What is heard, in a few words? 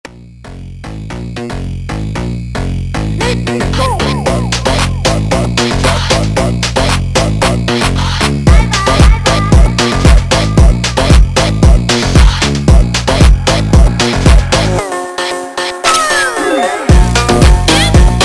slowed